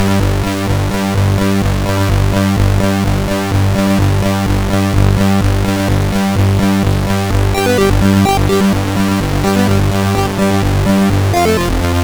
Chords, CSG 8580R5

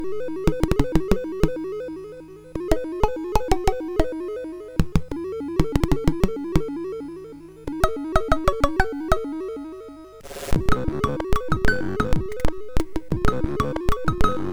laidback version, sid MOS6581R2; hvsc

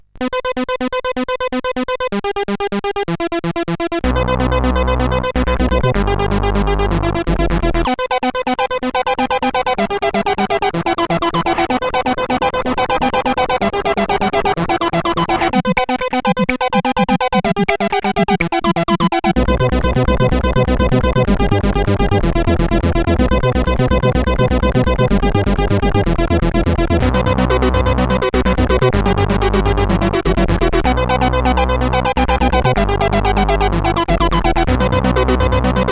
Rave, sid CSG8580R5

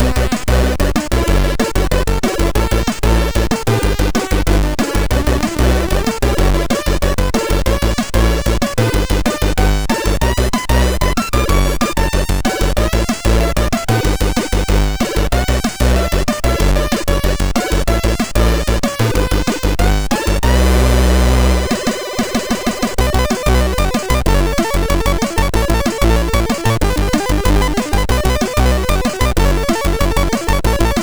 Snare Intro, MOS6581R4; sid; MCA

